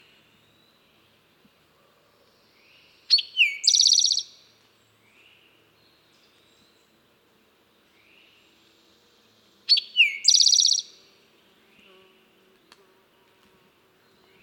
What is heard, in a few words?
Eastern Towhee Pipilo erythrophthalmus